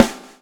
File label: swing